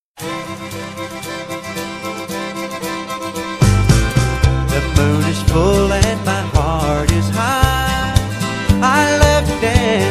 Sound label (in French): STEP TEXAS STYLE, Etats-Unis